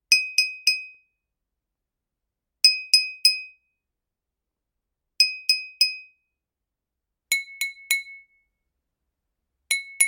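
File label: sounds of clinking glasses at a celebration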